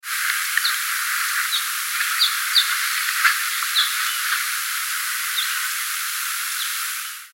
Lesser Goldfinch, single chips, in flight, Plain jee or ee-ee, often heard when flushed and uttered in glides between wingbeats (F).; FAMILY EMBERIZIDAE